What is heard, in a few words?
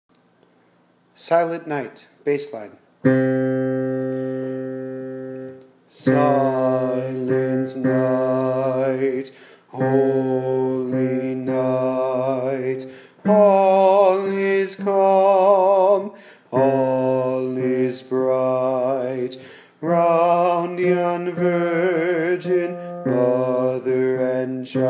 bass silent night